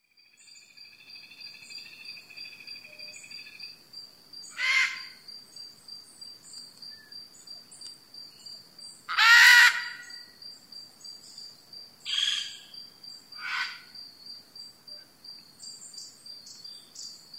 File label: birds of the rain forest